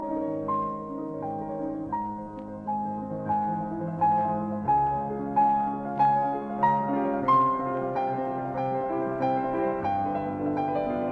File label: Etude in A flat major, Harp Study; 12 Etudes Op 25; Etude No 13; Paul Badura Skoda